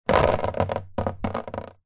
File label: nature water drips sudden on card folder binder